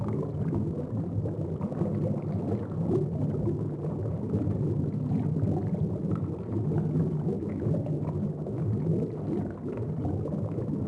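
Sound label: warehouse pipes loop; Star Wars Obi Wan; XBOX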